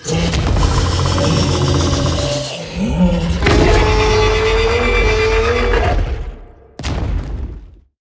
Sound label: dragons screech; sfx; tyranthor